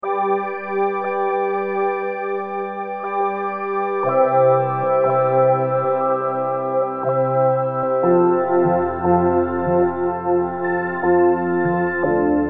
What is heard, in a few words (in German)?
string sequenz